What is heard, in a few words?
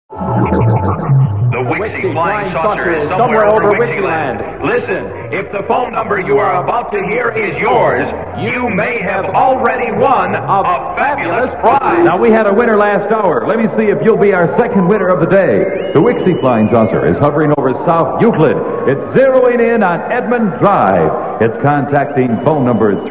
Flying Saucer